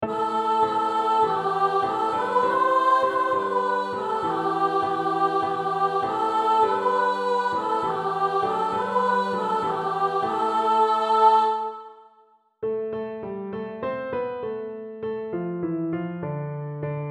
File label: Soprano; Gaudete